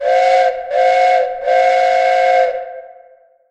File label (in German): dampflokpfeife 3 mal kurz; salamisound